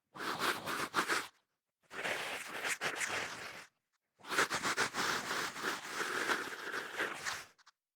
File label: female aggressive zombie